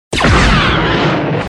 blaster; star wars

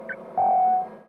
pingy

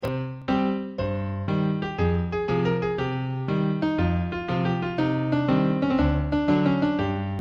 piano sea

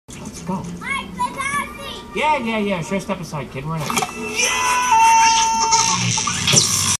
scream; mike wazowski

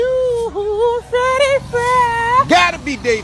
vocals

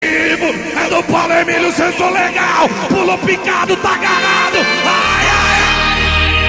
ayayaya

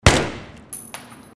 G36c single inside

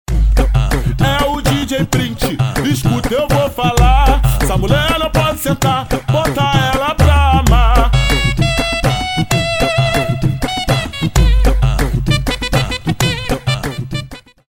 music

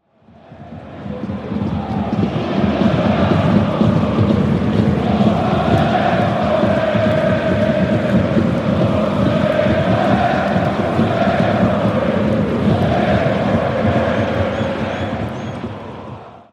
ambience stadium

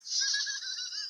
laugh

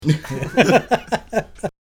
laugh

